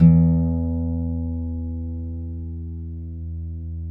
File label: TAKA FINGER E; Guitars